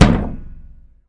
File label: kick loose; Kicks; single hits